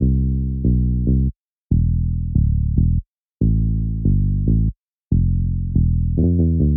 Pop Future Pop

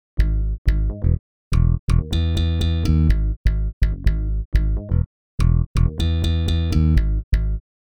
Bass 808s Dreamy Before

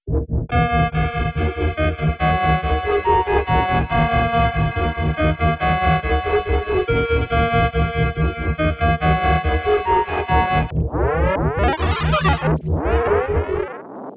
Melody

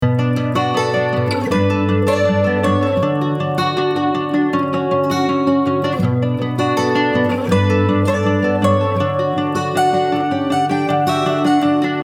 160 BPM Bm; Hope

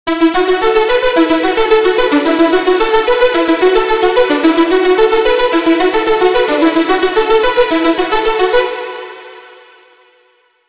Melody Dreamy

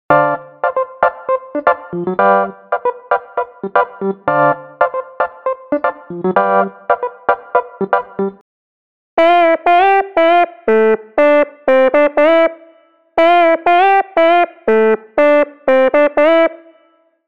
Funk; unisynth

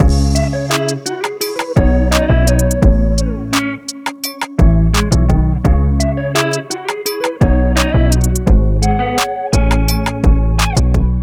Full Beat Guitar Beat

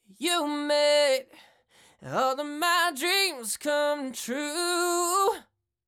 Vocals Clean

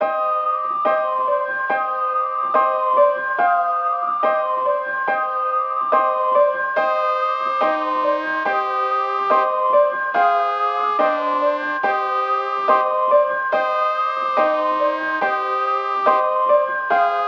Melody

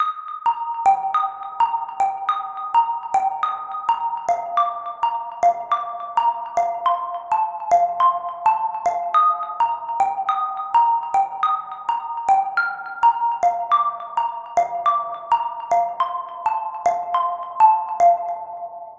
Melody Pluck Ambient Downtempo; midi wizard 2; Unison

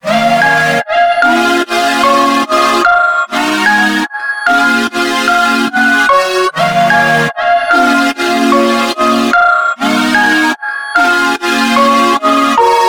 148 BPM Bbm

